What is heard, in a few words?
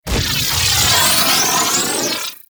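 Missile Swarm Projectile By; Warframe; Corpus; Corpus Railjack; Spec Ops Arachnoid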